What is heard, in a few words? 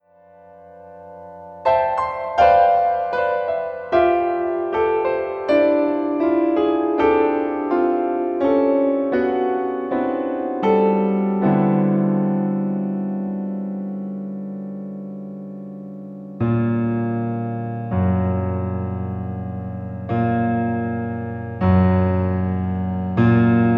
Piano Const3a; solo album